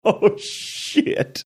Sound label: laughing saying oh shit